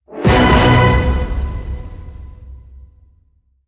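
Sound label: Hit Orchestra Double B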